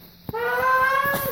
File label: Weird Cam Noise